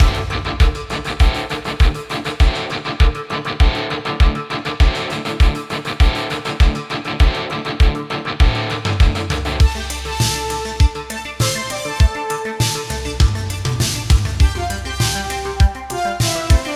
80s Dungeon Intense; Cryptheim

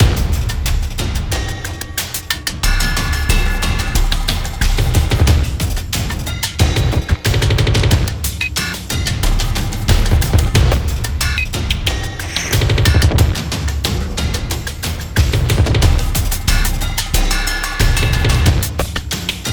Mountain Small Fight; sky break

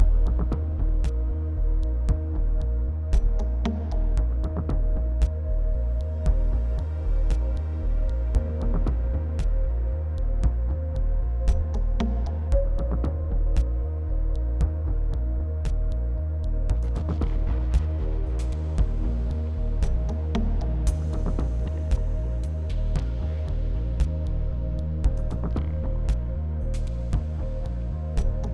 Puzzle 57 5BPM

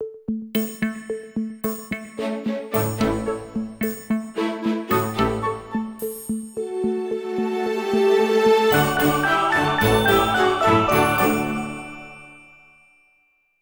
Fireworks Music Bronze

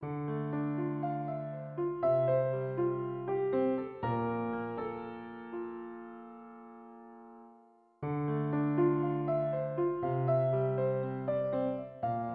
Piano Loop; PS Vita

